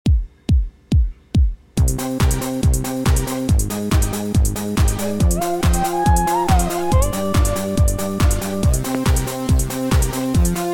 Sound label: Beat and vocal sample